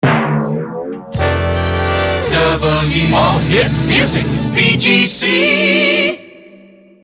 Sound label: jingles resings tympani all hit music; pams series 42a